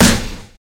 bright snare a sharp key

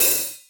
cymbal drum sample d key

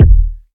smooth bottom end kick drum single shot e key

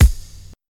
verby bass drum F# key

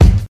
loud bass drum g sharp key

